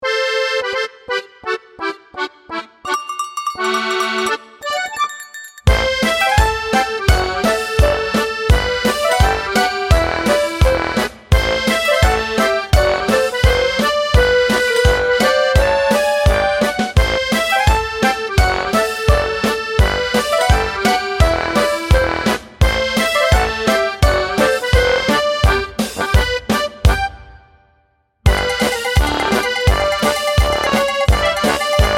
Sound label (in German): Volks Musik